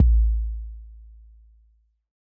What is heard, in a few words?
kick 808 Am short; Kicks; single hits